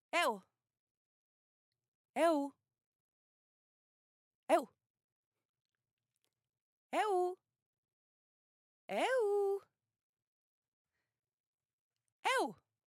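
zovushij zvuk eu zhenskij, calling sound "eu", female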